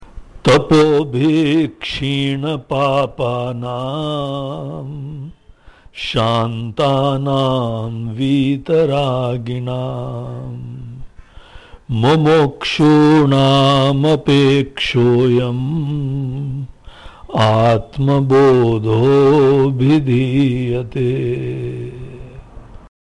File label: chant of atma bodha shloka